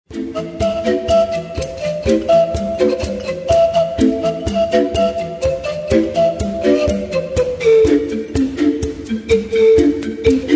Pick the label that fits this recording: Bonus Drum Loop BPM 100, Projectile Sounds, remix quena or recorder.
remix quena